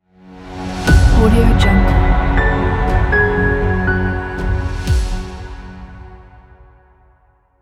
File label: cinematic tension logo; sawtooz